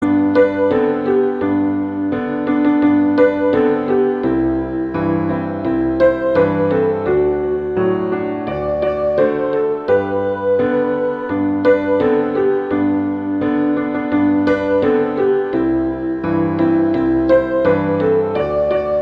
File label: Jingle Bells, Christmas